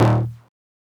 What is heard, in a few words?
D-1 Bass; Royal Orange